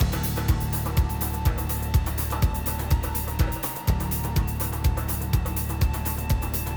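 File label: music fight court intro; SND MUS Courtyard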